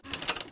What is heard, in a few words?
doors Door Open door open